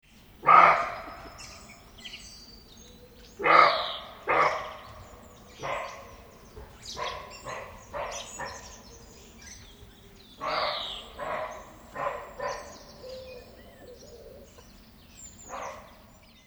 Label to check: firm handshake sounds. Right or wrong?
Wrong